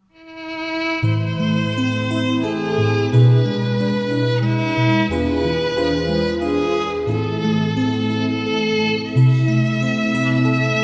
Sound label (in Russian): музыка